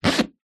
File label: foot move squeak in flip flop; foley